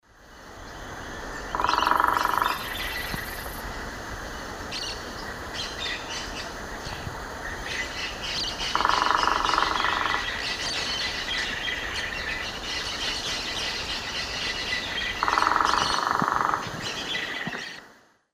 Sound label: Veniliornis passerinus, drum; S. America; Eastern Lowlands